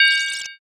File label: vs card